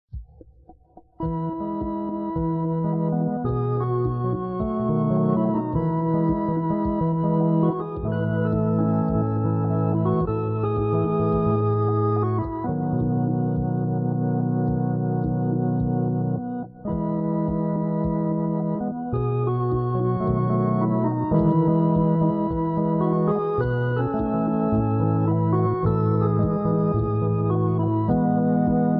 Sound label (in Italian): solo musica